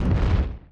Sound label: Explosion; 100rogues